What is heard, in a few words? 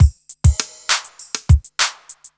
Smooth, R&B Drums